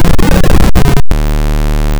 HIT HEAD; Dangerous Dave

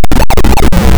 DEATH SOUND; Dangerous Dave

